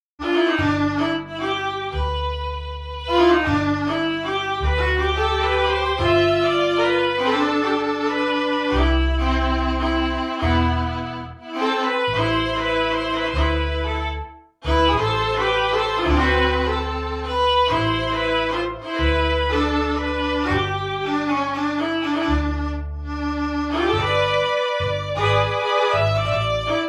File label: Viennese Waltz Gar Rev